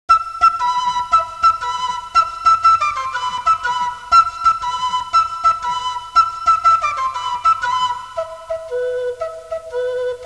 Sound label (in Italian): musica strumentale